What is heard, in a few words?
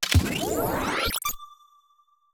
Laser Pickup